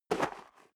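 step dress boots gravel light single right, footsteps; schritte; menschen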